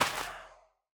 break, soulsand; The Monster Plane

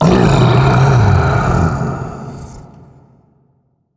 scream; zoochosis whale

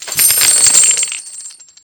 coins big; corrupted coin

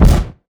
footstep; Garry's Mod; indigo park; nightmare catnap

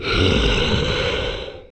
zombie attack scream; The Monster Plane; kolmods preatt

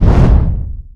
burning spear cast; sprunki mr tree phase 6

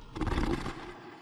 damage; corvus zoochosispack2; trevor henderson; the lamb

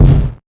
catnap footstep; zoochosis pack; nightmare catnap

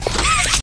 grabpack return; grabpack upgraded; pp3

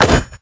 step; squid game pro jjk; squid game doll

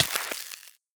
freeze hurt; cauldron frozen lava pack

